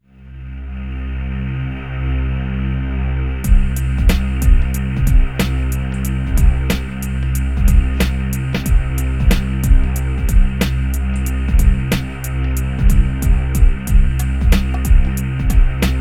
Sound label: atm low perc; fan music